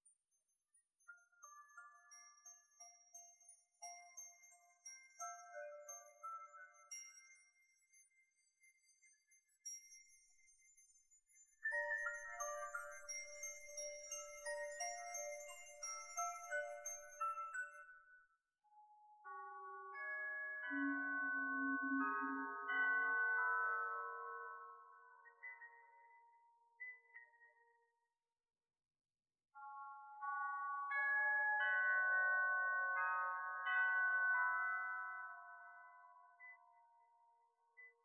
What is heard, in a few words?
glock and high piano; stems